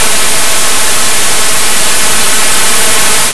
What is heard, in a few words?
gas